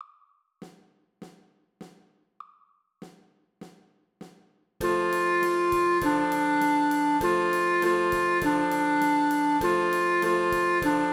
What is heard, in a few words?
Rain Rain Trumpet